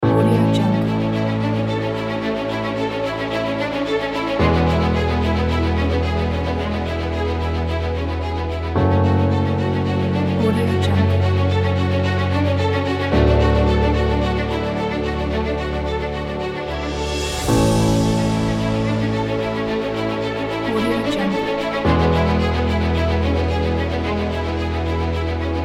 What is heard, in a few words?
inspiring cinematic